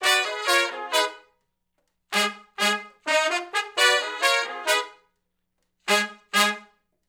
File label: R&B Riff (Ab) har to uni, Horncraft for R&B; Sections; Sonic Foundry